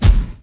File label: wheel